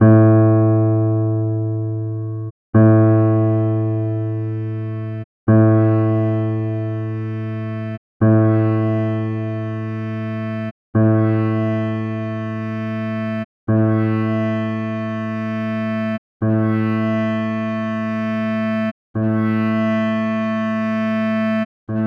repmorph Piano Clarinet

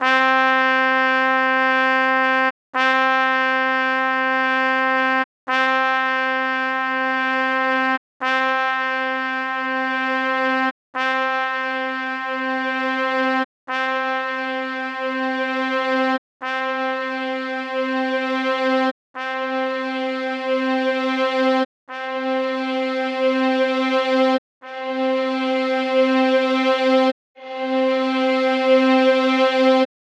Trumpet Violin; audioMorphs

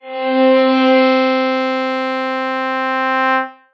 morph Violin Trumpet

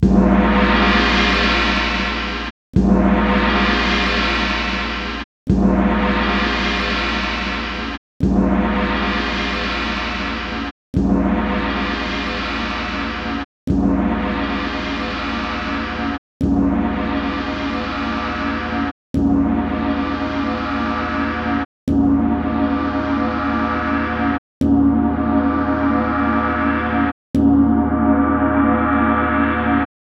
repmorph Gong Gong

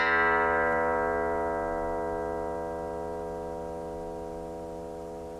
pluck Long; hyperOctave

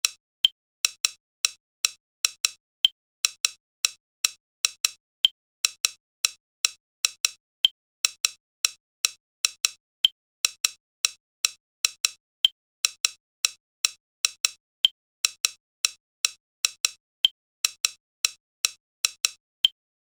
Tick Phase

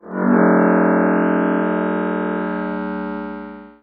morph lion Roar ching gong; interpmorphs

